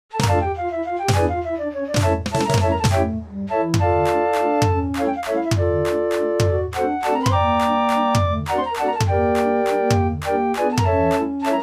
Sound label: Valse Musette, draaiorgels